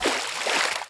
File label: Splash